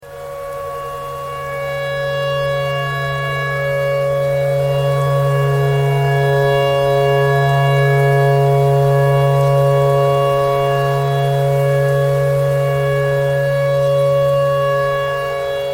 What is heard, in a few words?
tornado siren clarinet thread pentatonic